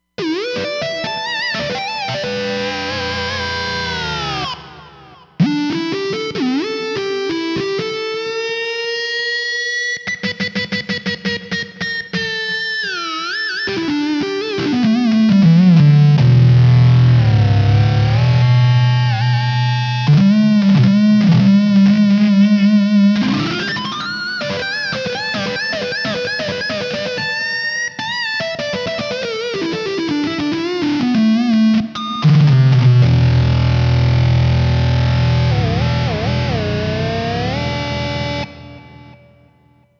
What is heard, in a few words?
Searing COSM, Roland Fantom XR